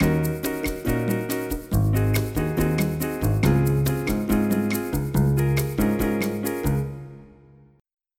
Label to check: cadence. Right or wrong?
Right